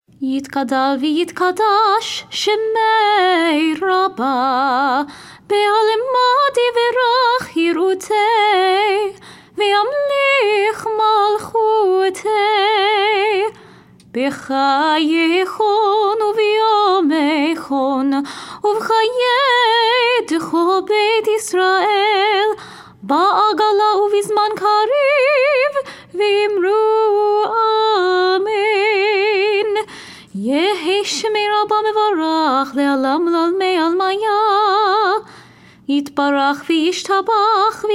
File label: hatzi kaddish festival ma ariv melody